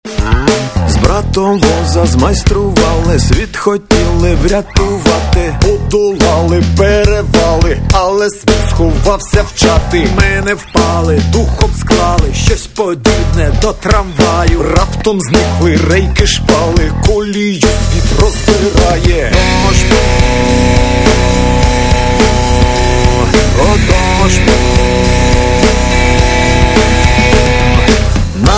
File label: rock